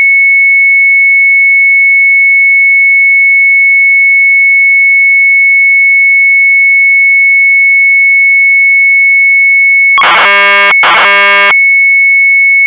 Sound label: MZ800 Tape, sharp; CALEIDOSCOOP; retromelsarchive